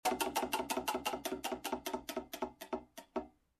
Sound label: wheel sound